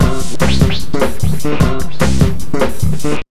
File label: 150, Big Beat Drumloops